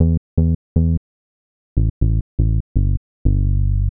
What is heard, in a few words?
Smooth Bass (120BPM), retro house